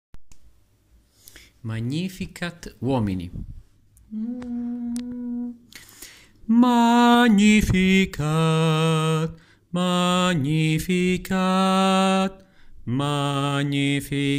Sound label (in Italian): magnificat uomini